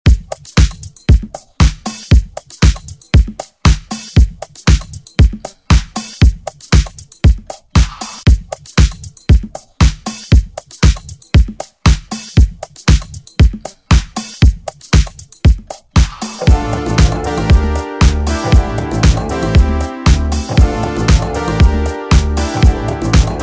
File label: Pop Redrum; Deejay Hit